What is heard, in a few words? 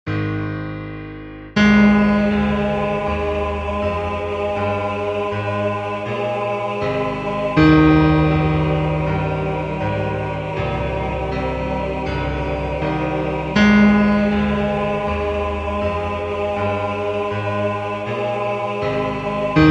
baritone; nightmare